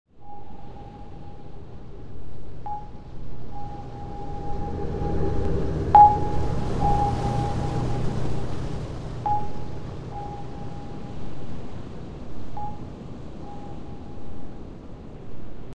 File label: submarine; زیردریایی